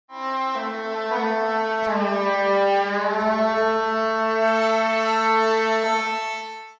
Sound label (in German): schalmei g